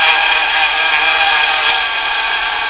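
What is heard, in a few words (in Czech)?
VCELA, bee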